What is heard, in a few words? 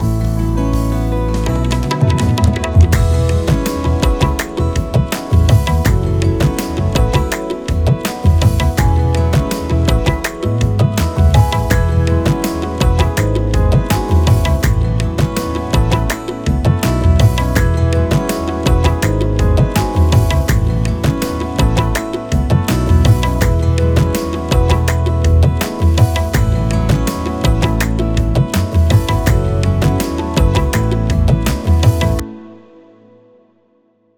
tabla